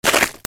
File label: FOOTFALL